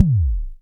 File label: BD, Bassdrums, Roland TD-20